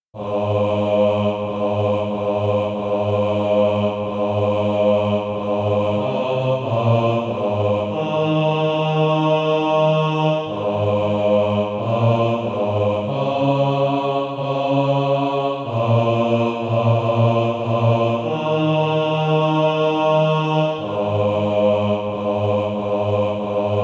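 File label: bass, Practise Music (SATB)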